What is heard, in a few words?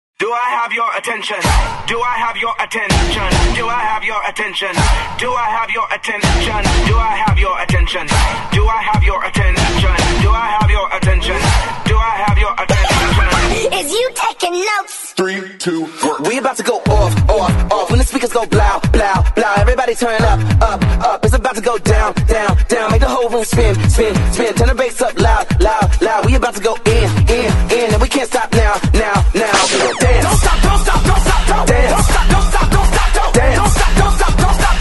Attention Cheer Mix